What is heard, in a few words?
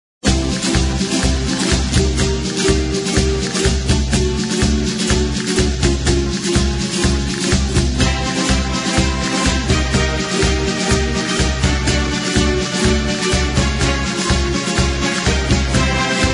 Spanish Flamenco Matador